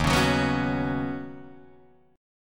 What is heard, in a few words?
D sharp Minor 7th Sharp 5th, Guitar, DADGAD